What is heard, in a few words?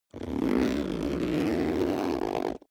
zipper backpack close slow